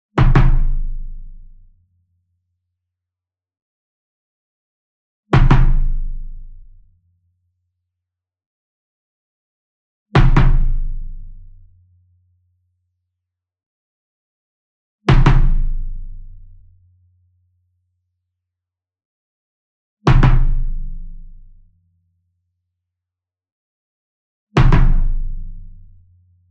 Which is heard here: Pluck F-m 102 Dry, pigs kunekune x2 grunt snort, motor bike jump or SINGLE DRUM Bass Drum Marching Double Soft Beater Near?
SINGLE DRUM Bass Drum Marching Double Soft Beater Near